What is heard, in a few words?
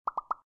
stars achieve